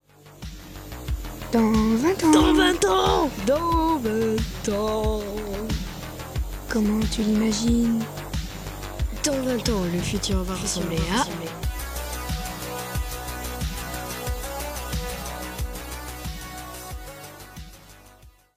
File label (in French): Jingle; Dans 20 Ans